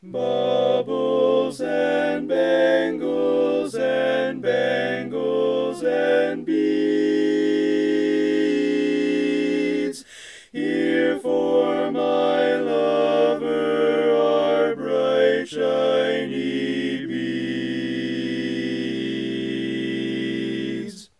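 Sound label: Baritone, barbershop tags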